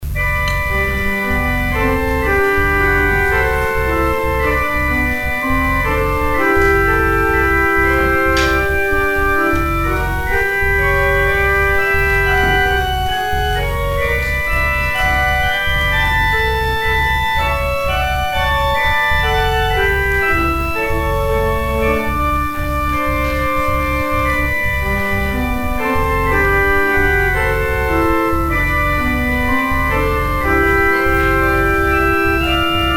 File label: organ interlude; Offering